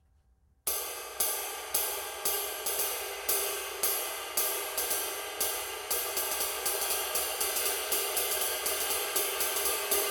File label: 22in Constantinople Bounce Ride, cymbal